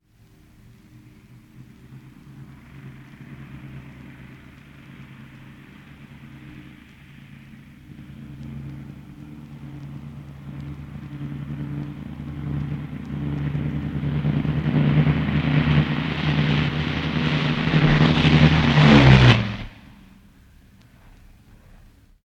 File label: Take off (Bristol Beaufighter, World War II); Airplane; Airplanes; BBC